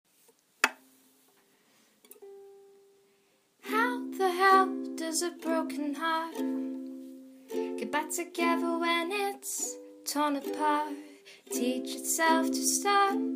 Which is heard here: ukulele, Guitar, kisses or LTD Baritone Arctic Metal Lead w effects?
ukulele